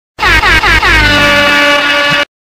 sirena reggeaton

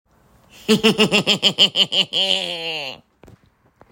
duende laugh

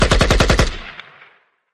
tiro ak47 short

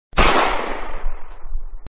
escopeta tiro

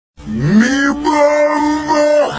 bombo slowed

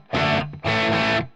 alerta

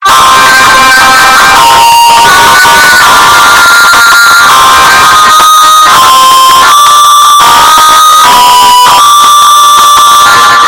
elevador; elevator music

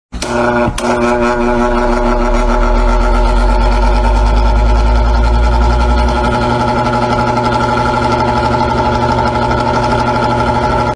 campana; campana de patrick

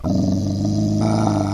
grunido lobo

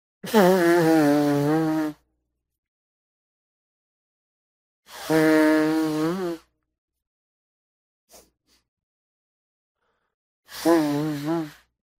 sonarse la nariz

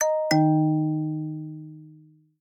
notificacion windows

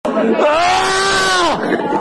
grito

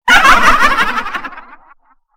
risita malvada

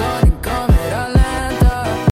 camera lenta

